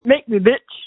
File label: Scream